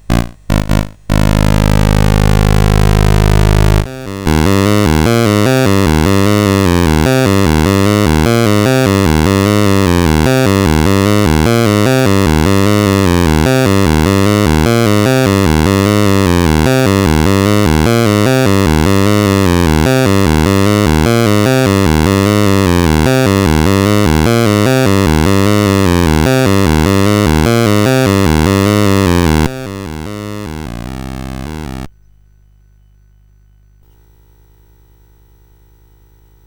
Bass Line, CSG8580R5; Darryl Pierce

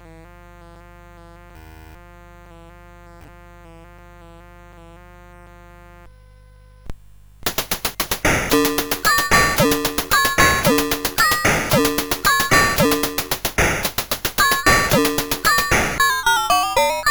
Drone, CSG8580R5

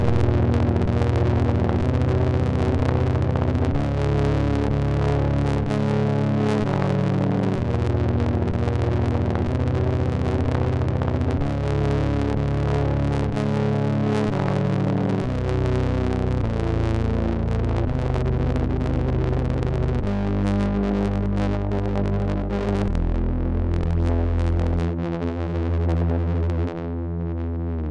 Geister, MOS6581R3

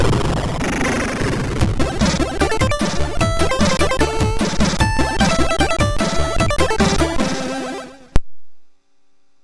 sid MOS6581R2

